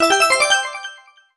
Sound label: 1UP; PMTTYD